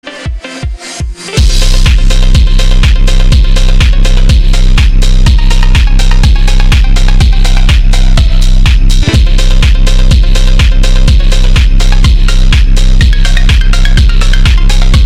phonk

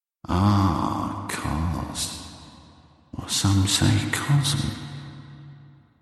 ahhhh

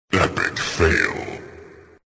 epic fail

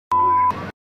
bip scanning

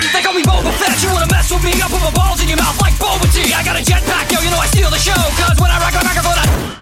rap; erb; bobbafet

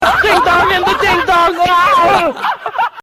ding dong

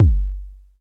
kick, new wave

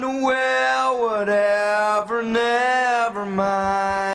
vocals only

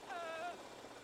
ahhh; gtavc

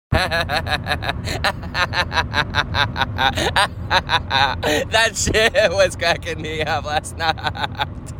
cackle; saturn

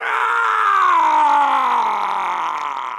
scream; mk9 baraka

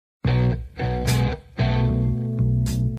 sms notification tone

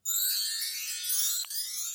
joy emote; animal crossing

